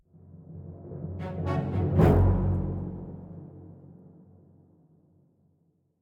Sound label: Combat End 121bpm; Biome 3